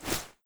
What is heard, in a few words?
grenade lob; weapons